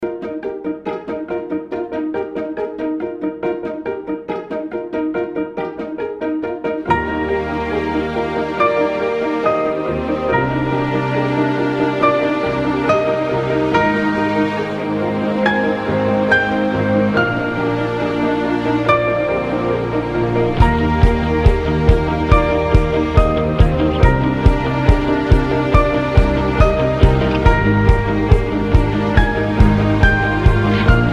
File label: BGM sea; witch spring 2 android ios mobile gamerip 2016